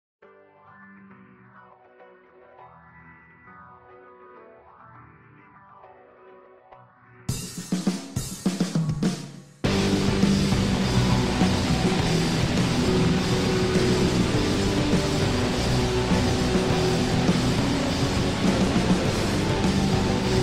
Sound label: White Noise